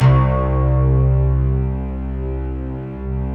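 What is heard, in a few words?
bright guitar strings; Optical Media International - Sonic Images Library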